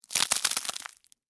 Crack Crunch Soft, Texture, Crack, Crunch, Soft, Ice, Cream, Cone; Food; BlastWave